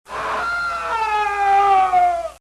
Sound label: Mans Scream